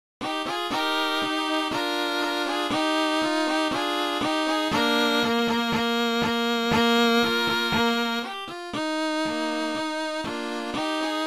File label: rondeau trio